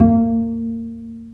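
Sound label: String, contrabass pizzicato; Roland - String Master Series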